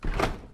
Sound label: Door Fridge Open